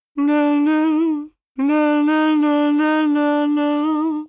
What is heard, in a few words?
Humming; Hyrule Warriors Definitive Edition; Yuga's Humming